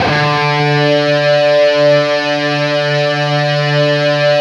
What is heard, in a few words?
LEAD D, Guitar; East West; Steve Stevens Guitar Samples Collection